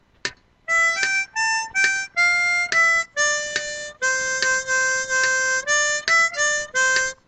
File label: Solo